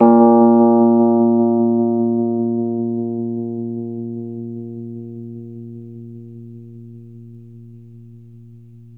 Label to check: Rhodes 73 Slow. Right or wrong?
Right